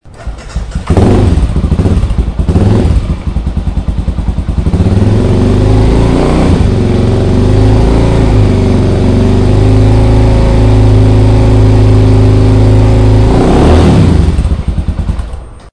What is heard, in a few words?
arrow f650st, Exhaust